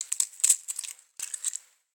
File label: egg